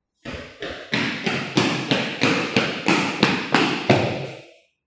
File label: Person Comes Running